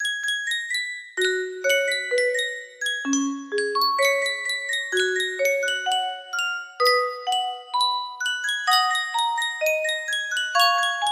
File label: sankyo custom tune music box